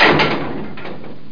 PORTASO; El Juego De La Oca Loca

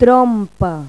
trompa

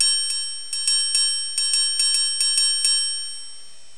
!TRIANGU; Pipo-Aprende musica con Pipo

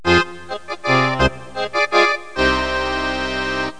ACORDEO; Pipo-Aprende musica con Pipo

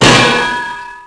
ARMADURA GRANDE; Blade of Darkness

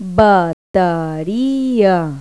batería; Pipo-Aprende musica con Pipo

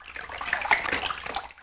desangre; Blade of Darkness

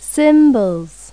CIMBALOS; Pipo-Aprende musica con Pipo

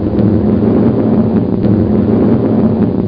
ruido; PCMania; biobomb

